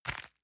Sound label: envelope grab medium